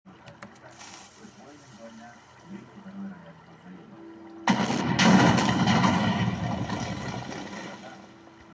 minecraft tnt x5

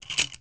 shotgun reload

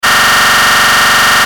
scream aaaaaah

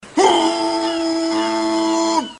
uuuuh

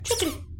squeak

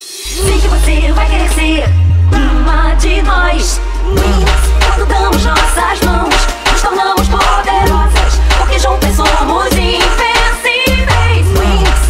funk

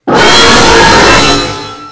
screamer

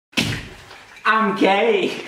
slap; im gay

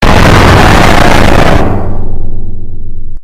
jumpscare sound; five nights at warios 4

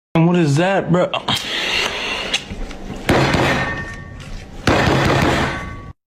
credit card slam

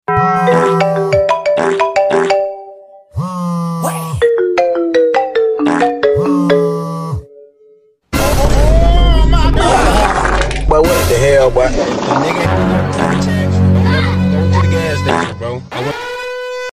goofy sound

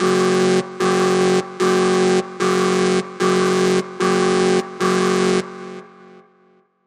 alarm

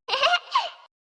cute giggle

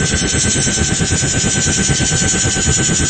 podracer engine; lego star wars; anakin

